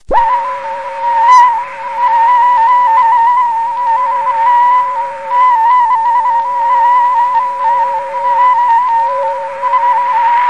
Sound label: mk6 piccolo vft